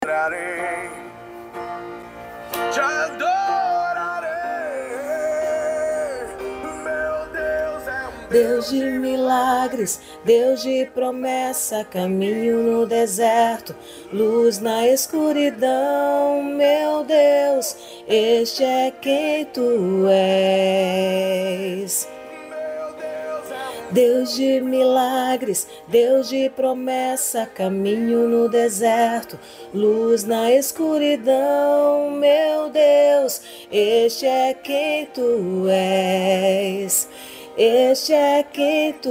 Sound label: contralto, vocal